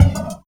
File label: Spitter Kick, Esoterik Beatz; Spitter Kit